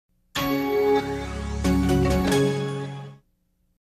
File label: Intel Sound Logo